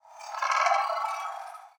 Crawler Idle

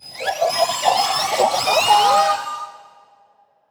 BABY MOLOCH alarm

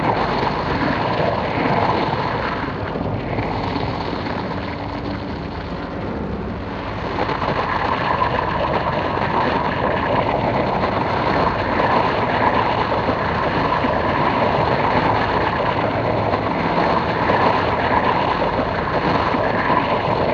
Alien weapon

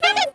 Hit Clown

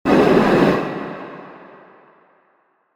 CARRIER attack

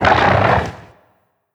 HUSKED CRAWLER attack

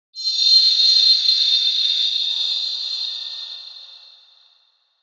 CARRIER cell Idle

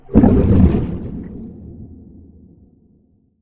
Attack; Coelanth

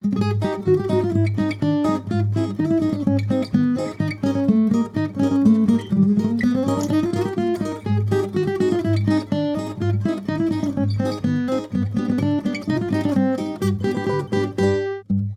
Guitar Clown

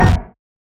burst; WATCHER

